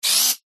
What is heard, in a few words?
science fiction robot servo motor short burst